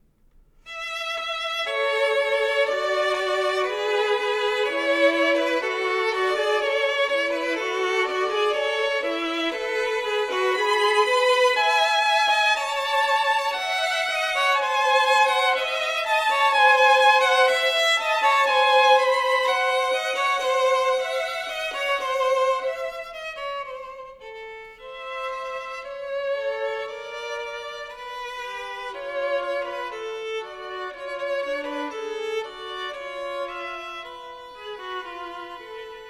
Duos for Two Violins, Midsummer Night Song

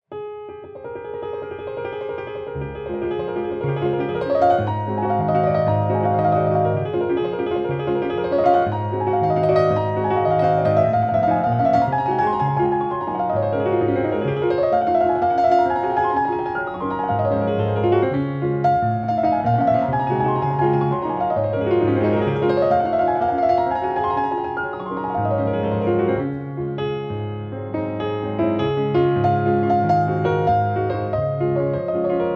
Waltz, in D-Flat Major

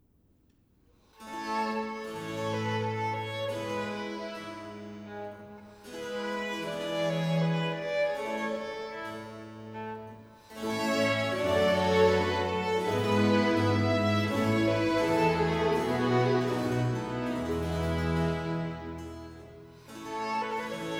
Sarabande; Corelli Concerti grossi op.6; Ensemble 415; Chiara Banchini; Jesper Christensen

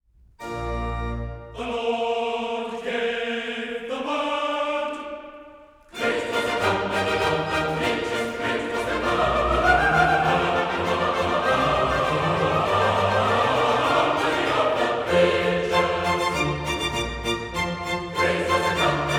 Chorus; The Lord Gave the Word; Sir Neville Marriner; Handel Messiah

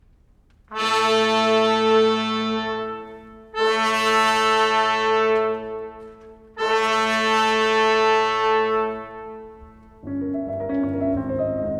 Improvisation; (After Boléro, Op. 19)

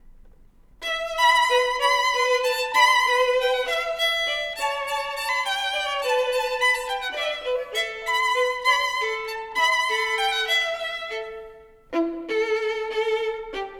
Duos for Two Violins, New Year's Greeting

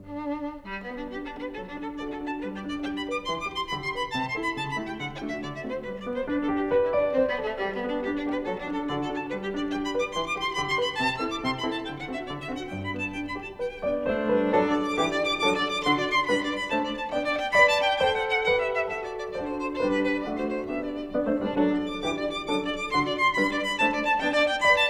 Variation, Cello; 10 Variations on Ich bin der Schneider Kakadu in G Major, Op. 121a Variation II

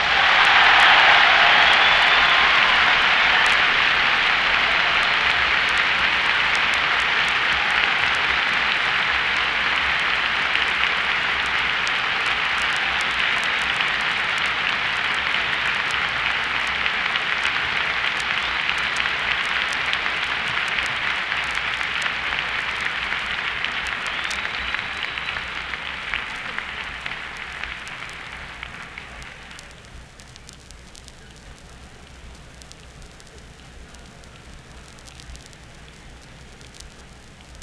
Applause; Transition to Twenty Years of Jazz

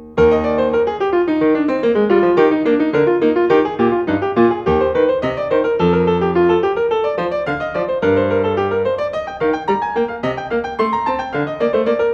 Air; Glenn Gould; Bach The French Suites Nos. 1-4, BWV 812-815

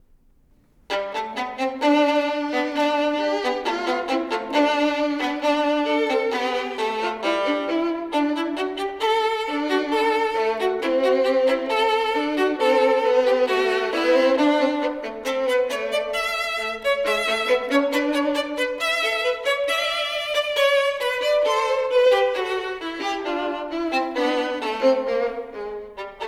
Duos for Two Violins, Play Song

